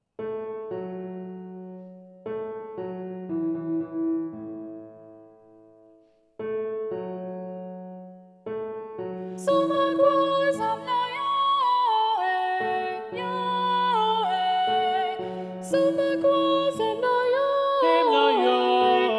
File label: SATB - Bass Muted; Somagwaza